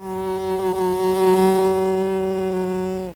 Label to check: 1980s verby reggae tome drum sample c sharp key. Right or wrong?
Wrong